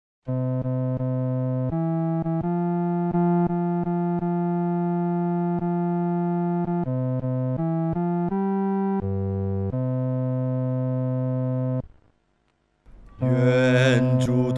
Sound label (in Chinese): 独唱 第四声